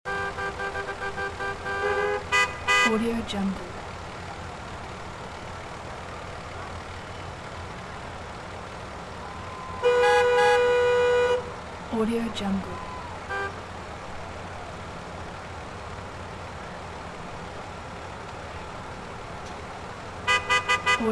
traffic jam ambience; audio-tree

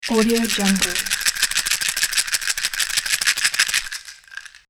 rattling a pill box

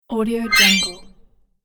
digital game fountain revive

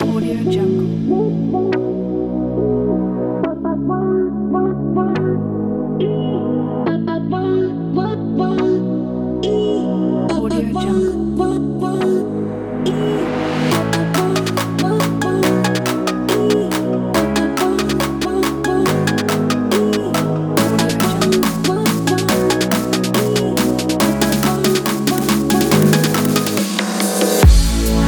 future bass

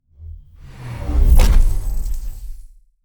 robotic lifeforms whooshes mecha step sweetner; robotic lifeforms 2; soundmorph

